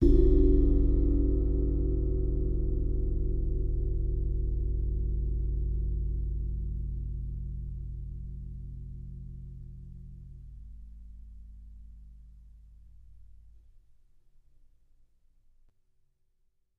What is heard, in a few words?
18 Cymbol Hit; Cymbol Bell Bowed; Sabian